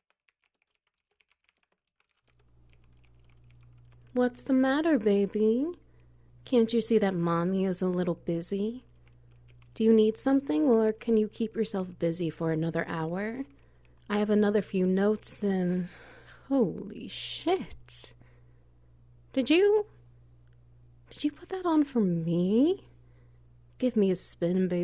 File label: Wet Noises; On Your Knees, Under the Desk